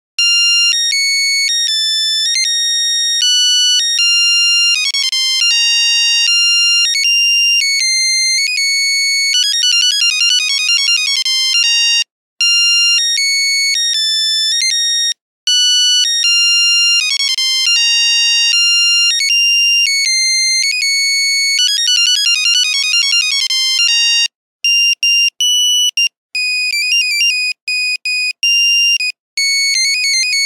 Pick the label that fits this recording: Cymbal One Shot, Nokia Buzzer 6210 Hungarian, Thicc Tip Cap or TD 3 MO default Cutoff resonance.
Nokia Buzzer 6210 Hungarian